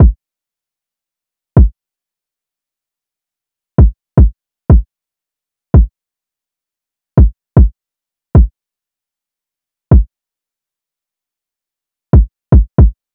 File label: Drum Loop, 115 BPM Kick; Loop Stems; Cymatics; Vibes II Drum Loop Collection; Round Two